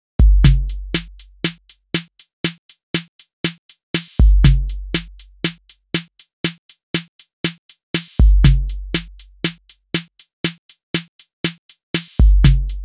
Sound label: drums